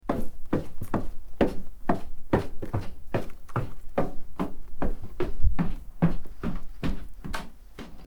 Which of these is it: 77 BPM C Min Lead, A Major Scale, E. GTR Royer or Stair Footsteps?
Stair Footsteps